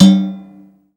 techno, percussion techno; Ueberschall; Techno Trance Essentials; DRUMS

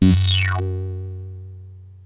JUNO 60 C3

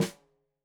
Snare Stereo, Drums; Deeply Sampled Drums; SMDrum

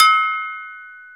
bell open; EMU Planet-Earth; Toke

